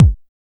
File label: RAVETHUMP K; Drums In Your FACE!